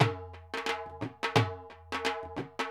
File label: Dhol